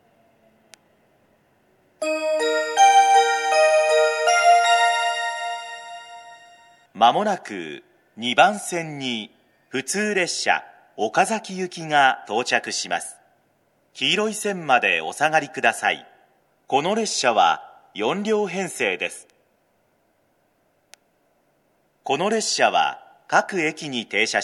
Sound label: local, station; owari-ichinomiya; okazaki; jr-central